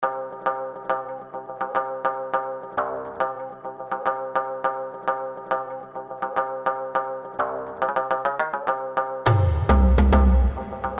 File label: instrumental